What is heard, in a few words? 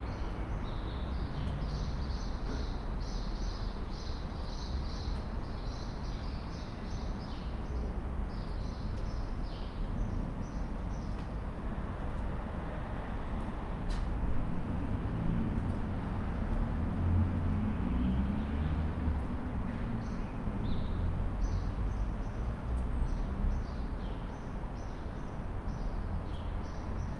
suburban tunnel birdsong distant traffic pedestrians mumble; fvtt sonniss gdc audio